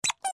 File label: Bell